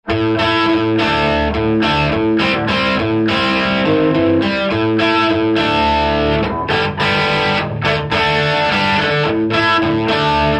jubilee, amps

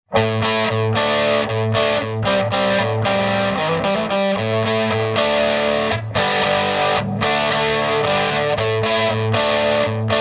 hiwatt c50 combo, amps

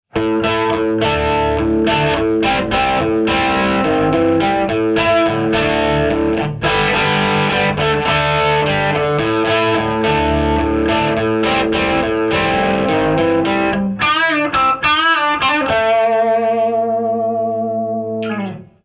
hiwatt, amps

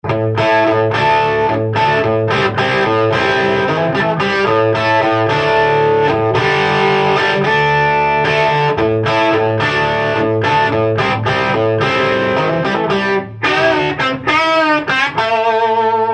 marshall 1959, amps

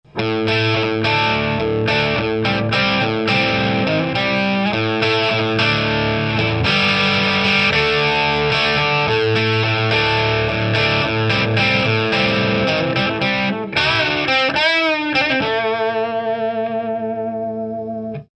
jmp 100; amps

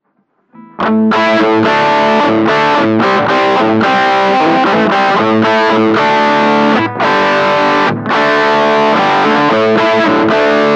jcm 900; amps

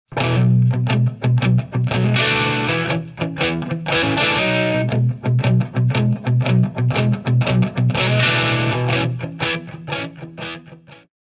jmp 100 boogie, amps

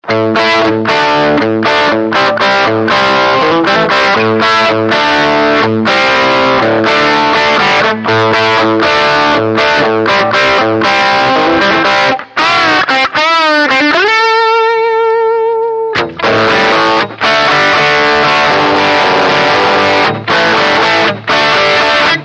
hiwatt C50 bright lick, amps

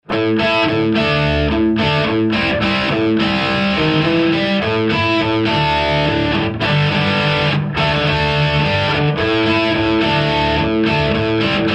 mesa boogie, amps

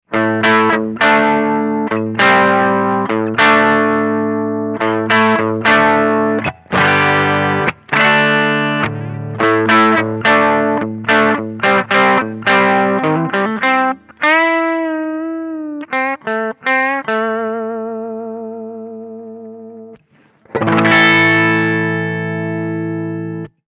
peavey bright, amps

